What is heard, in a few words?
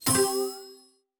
panther collected